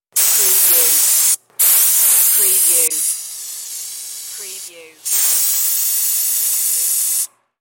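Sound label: Compressed Air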